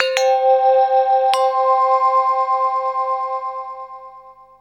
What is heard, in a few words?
Bell; Zero-G Ambient 1; ECHO BELLS